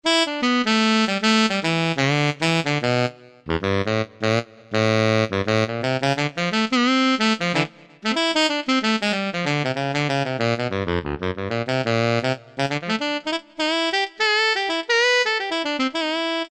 Alto, Yamaha TG500

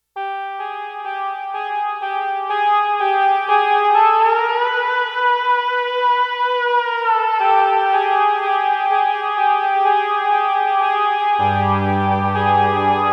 Woody, Roland JX-1; Factory presets; Additional factory patches

